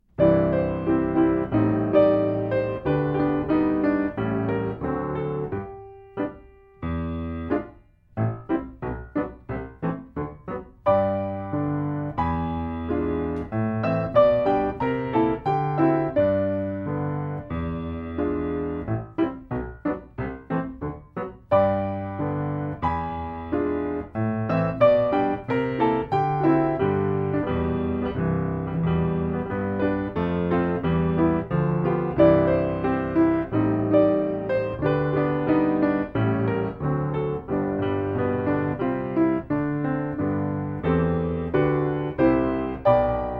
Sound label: Blues